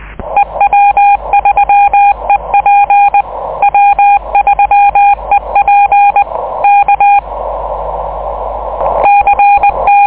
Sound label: cw cq; 6M audio; 50Mhz; cycle23